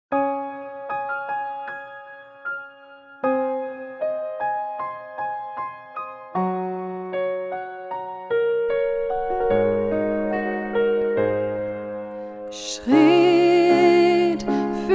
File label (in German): Hochzeitssaengerin; bern